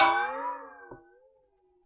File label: BOX FX; Univers Sons - Raricussions